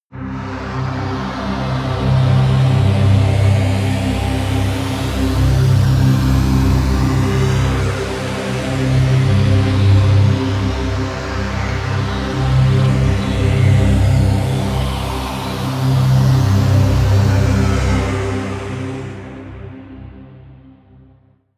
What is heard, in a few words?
pad sweep flanger hard; flaechen